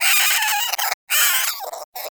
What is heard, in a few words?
Cryptilex Vocal Death, Hunting Critters; Characters; Warframe